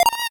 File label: 8bit se